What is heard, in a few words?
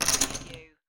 Poker Chips Drop Stack Of Chips On Wood Table